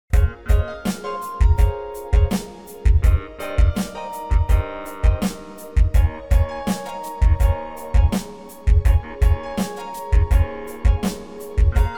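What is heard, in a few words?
Motorola ringtone; ringtony motorola razr v3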